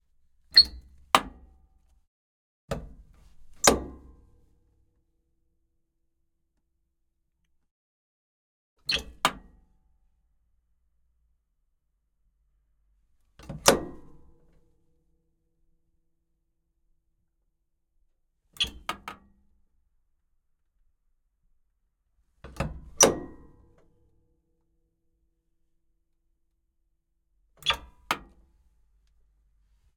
Patria 6x6 Door Handle Open and Close Interior POV INTERIOR; MS Decoded